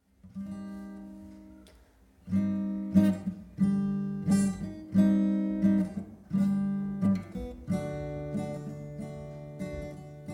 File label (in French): larmes guitare